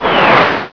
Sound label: missile, weapons; quake2